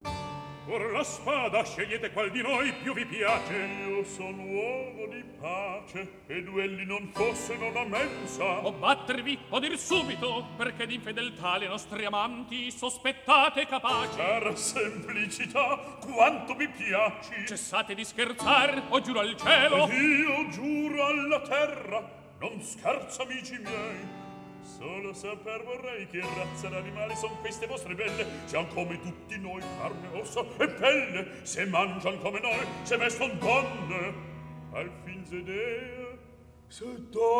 Recitativo; Philharmonia Orchestra Chorus; Fuor la spada

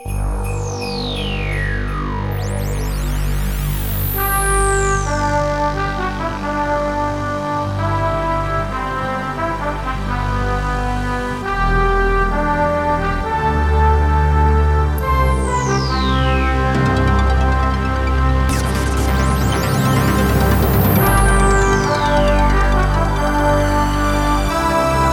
Instrumental

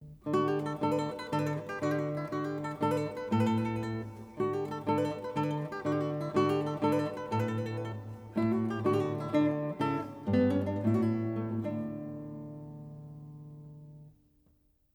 Folk Songs for Guitar

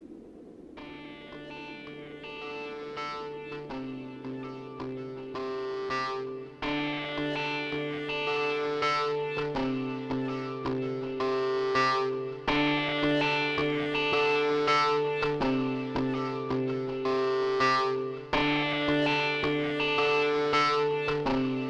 instrumental

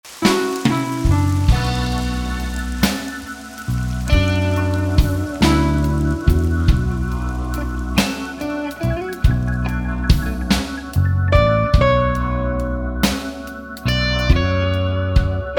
Blues

